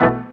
DR. LOOP; X-Static Goldmine 3; CHORDS

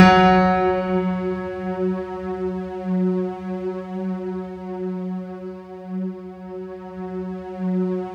F#3, Synth Line

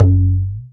CONG TOM, CONGA BONGO; Techno 138 BPM; Best Service ProSamples vol.54; AKAI

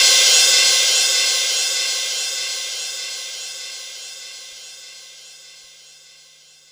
Cym Crash Loop, Korg 05R-W Korg Cymbals; 300 Drum Machines

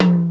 505 TOM, TR-505; Hollywood Edge; The Big Bad Beatmachine

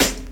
KIT, Dance Mega Drums, HIP; Best Service

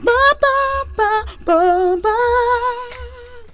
FEMALE, Hip Hop

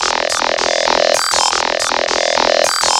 Vocobeat; Sonic Foundry (Sony Creative Software)

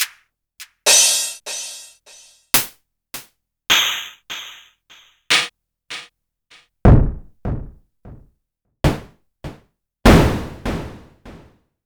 Korg Electribe ER-1 Kit

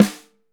5X14, Drums

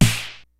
G-FUNK KIT, DRUMS; Kid Nepro; Elite

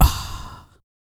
PERC, MALE PERC

